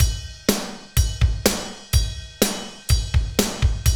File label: Medium Tekno, Drumscapes Roland